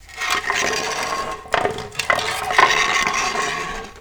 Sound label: Ribs Stn Roll Lp; moul-assets